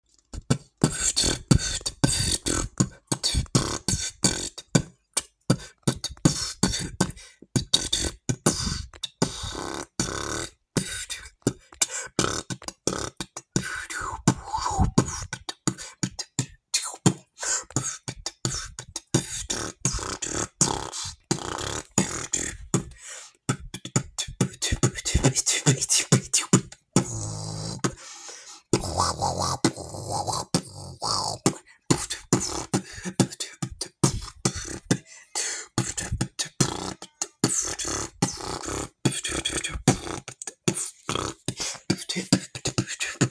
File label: beat boxing